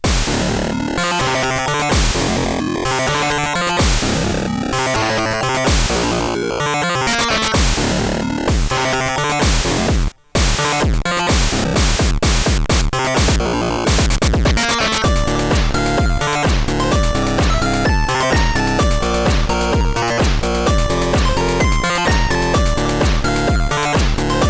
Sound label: saws and noise